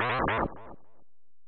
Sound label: error; gnome-audio-k1